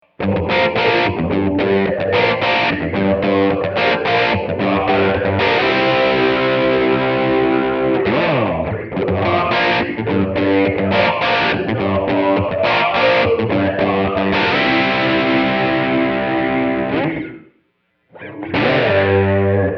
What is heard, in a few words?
chorale; bigsky; reverb; Angry Mob